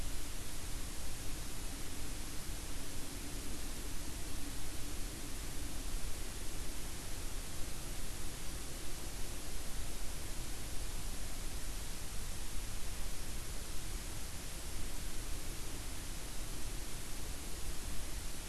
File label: WFR audio emfisis